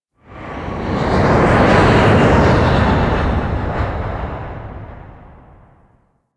Drop Pods Fly By; Warframe; Eidolon

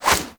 Inf Punch Whoosh C; Warframe; Weapons; Infested; Inf Kick Punch

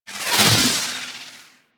Arachnoid Wraith Melee Proj By; Warframe; Corpus; Abilities

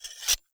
Dagger Unequip; Weapons; Enemy; Daggers; Sinecure Dagger

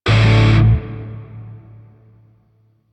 Shawzin Yo Chord H; Music; Grineer; DE; Warframe

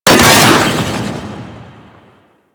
Arch Cannon Fire 3D, Weapons; Warframe; Archwing

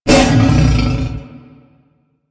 Wolf Bite; Animals; DE; Warframe; Quests; Duviri

